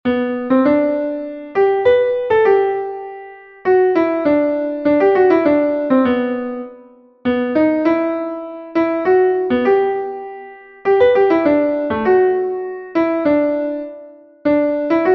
wenn die schwalben heimwaerts ziehn, klavier

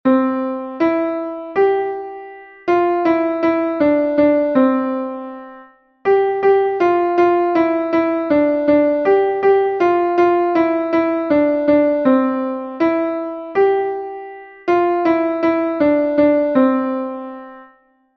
a a a der winter der ist da klavier

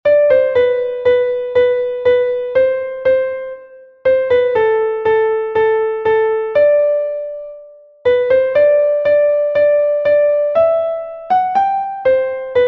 in meinem kleinen apfel, klavier

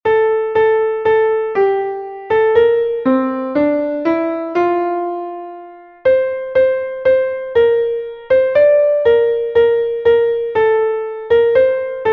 himmel und erde muessen vergehn kanon, klavier

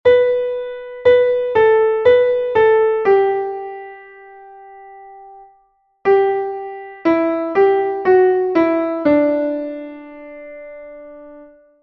klavier, Weihnachtslied; Leise rieselt der Schnee; MelodieMeister

